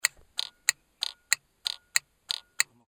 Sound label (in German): Blinker